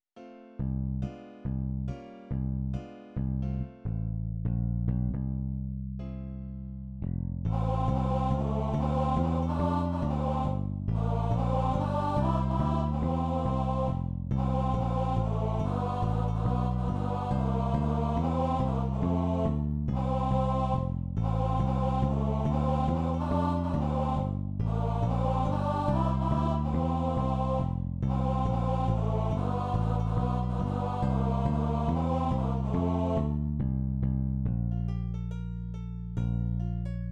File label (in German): Tenor